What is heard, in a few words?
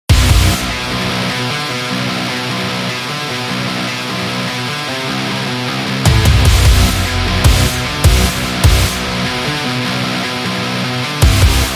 Metals